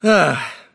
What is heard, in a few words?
laugh; davion; dota; dragon knight